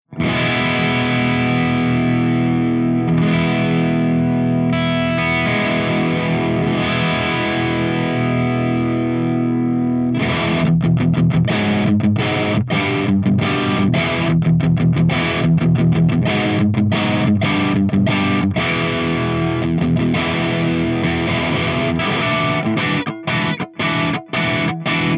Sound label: ENGL